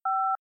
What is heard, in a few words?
DTMF 1